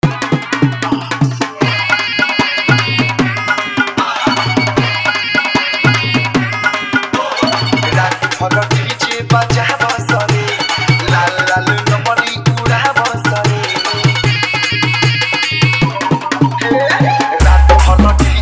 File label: Bhojpuri Desi Dhollki Bass Rod Dance Mix